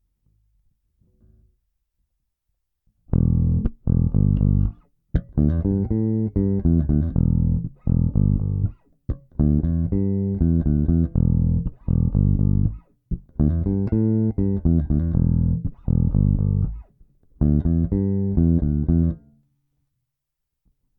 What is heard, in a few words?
L1500 Both pickups Passive; Tona 100